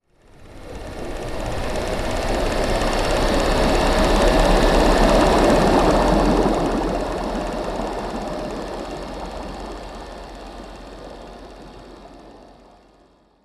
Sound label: Submarine torpedo launch (slow with propeller)